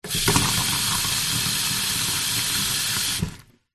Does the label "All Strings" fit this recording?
No